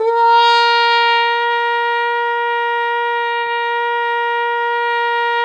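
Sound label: Plunger Mute, Brass; Roland L-CD702; Plunger & Wa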